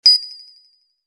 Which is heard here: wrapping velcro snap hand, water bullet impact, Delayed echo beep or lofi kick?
Delayed echo beep